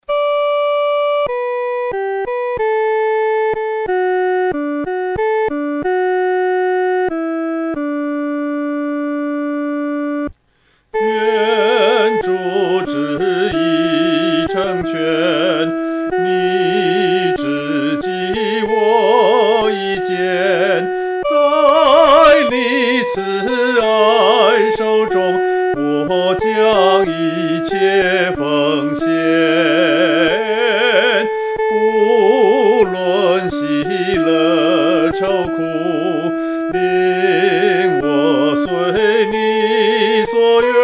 愿主旨意成全 独唱（第一声）

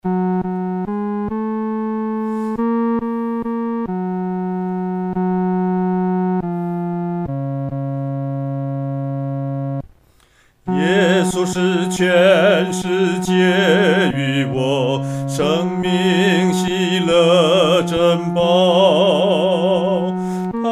独唱 第三声

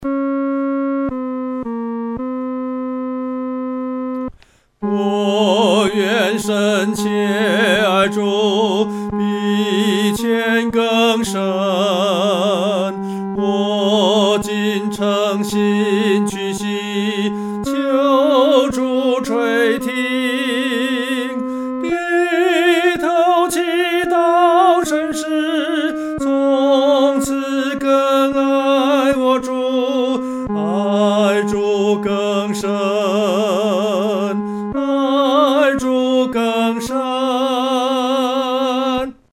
独唱 第三声

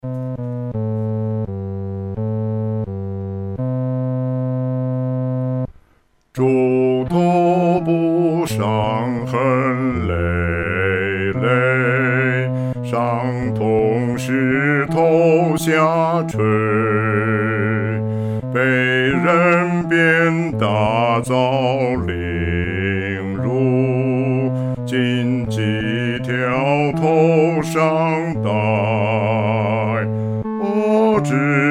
独唱 第四声; 至圣之首受创伤

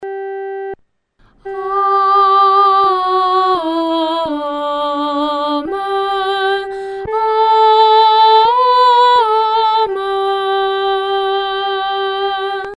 阿门颂（一）-独唱（第一声）

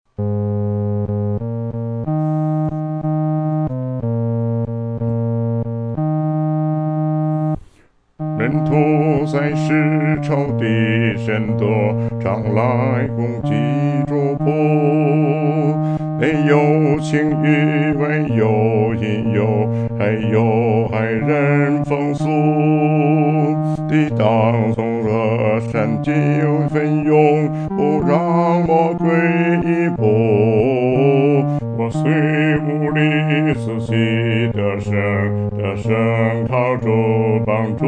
独唱 第四声; 靠信心得胜

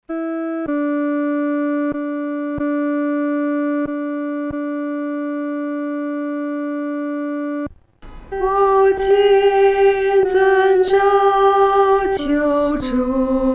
独唱 第二声

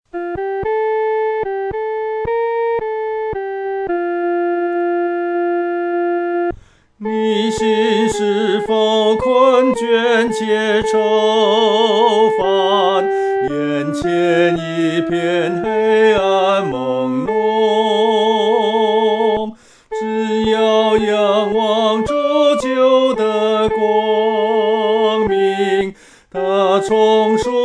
独唱 第一声; 当转眼仰望耶稣

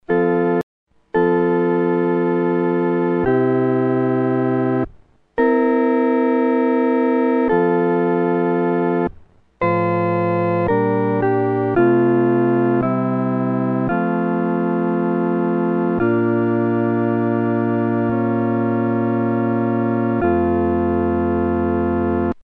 合奏 四声部; 阿门颂（三）

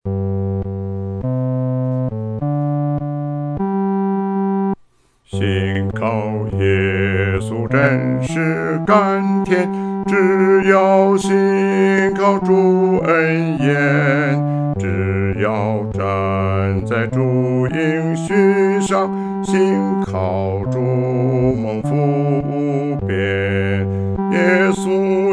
独唱 第四声; 信靠耶稣真是甜美

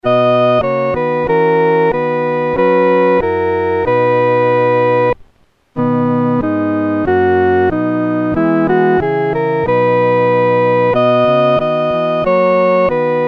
独奏 第一声; 欢然颂主

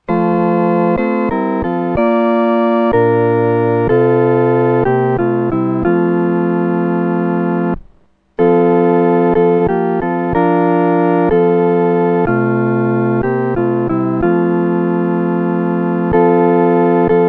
合独奏 四声部

